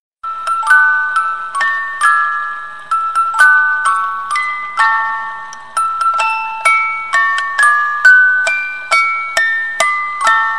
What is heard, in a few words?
happy birthday muzikalnaya shkatulka